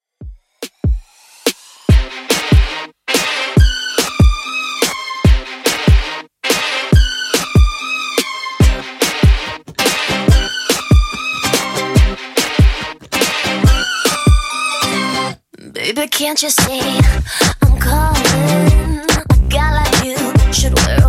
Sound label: Top40 Redrum 143 bpm